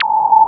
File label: noise fx; Masterbits; Switch FX